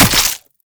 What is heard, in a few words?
Brood Spider Attack; Warframe; Oraxia